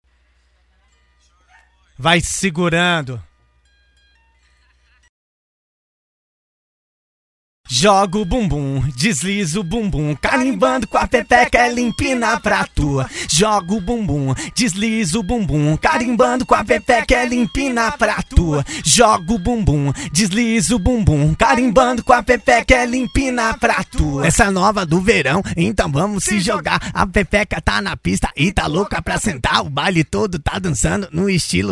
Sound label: acapella; Funk